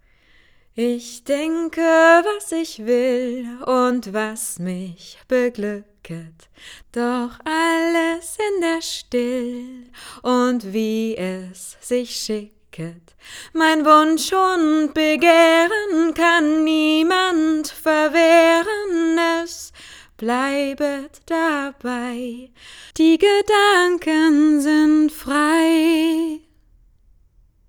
Gesang

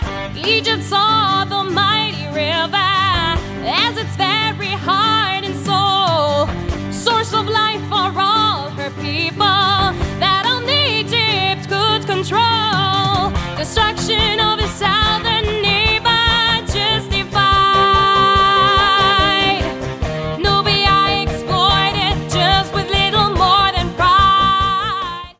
Gesang RockPop

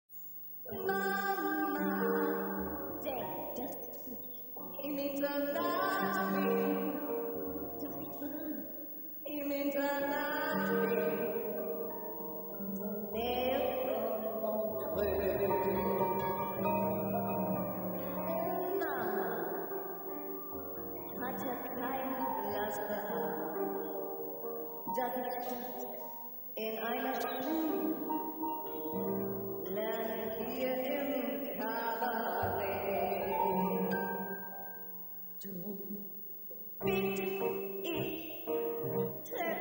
Gesang live

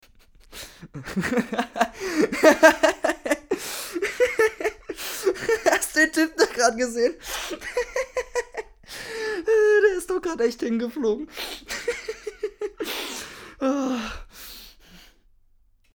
Lachen

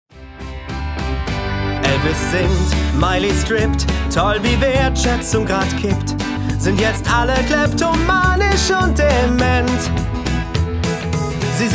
GESANG SCHWUNGVOLL

